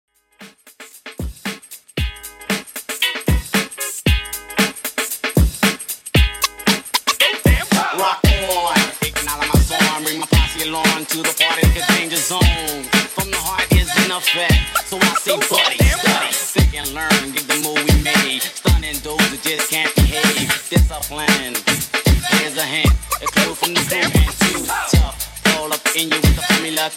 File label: 80s Intro 115 Bpm; djyan